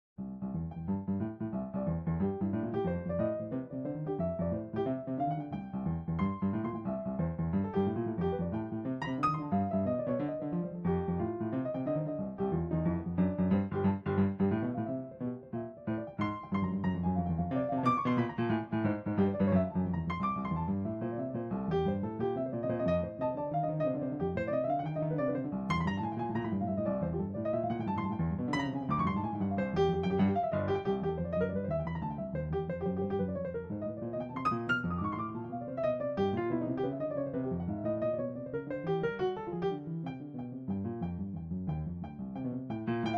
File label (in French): Petite etude de rythmes